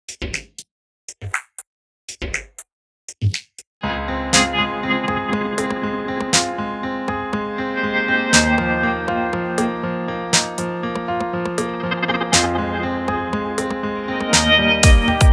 Deep Tech

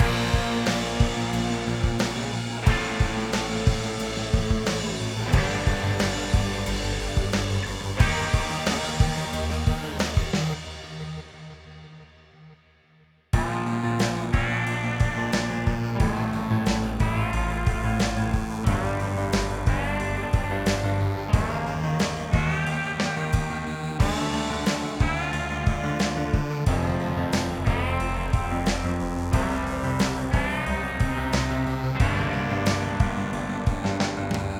Patterning NO BASS NO MELODY

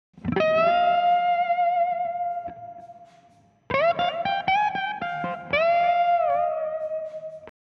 guit

